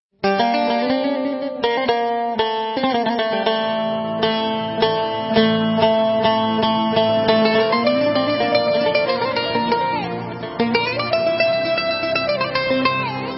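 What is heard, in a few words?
Instrumental, Israeli Dances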